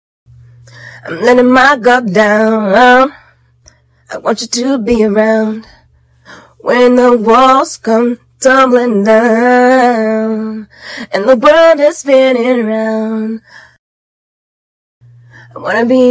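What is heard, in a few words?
pop 100 A minor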